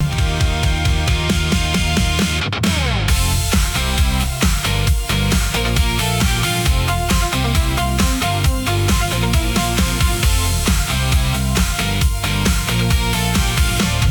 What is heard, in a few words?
loop